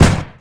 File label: shot gun